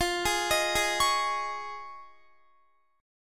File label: F Minor 7th Sharp 5th Guitar; arpeggiated; chordshape